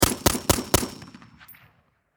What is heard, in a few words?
Warfare Machine Gun 7.62 Caliber Springfield M 14 Four Shots Distant Perspective